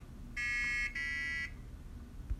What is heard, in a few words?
Node Low Power